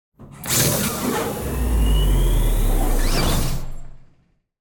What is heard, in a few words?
Door - Sci-Fi; SoundMorph; Portals